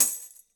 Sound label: tamb hit